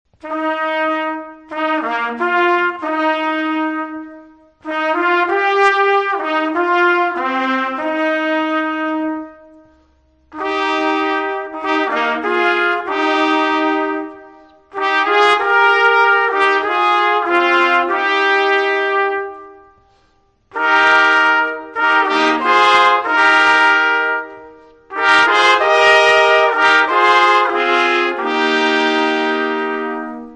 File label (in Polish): hejnal; nowy muzyczny symbol miasta zabrzmial po raz pierwszy z ratusza